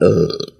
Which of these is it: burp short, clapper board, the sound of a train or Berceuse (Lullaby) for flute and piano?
burp short